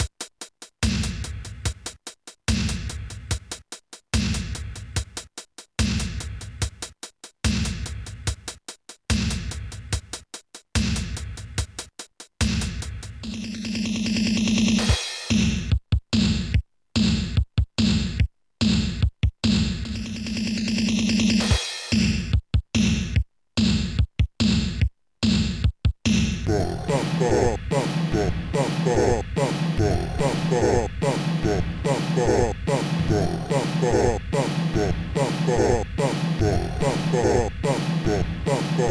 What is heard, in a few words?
effects, The Rhythm Machine; Adrum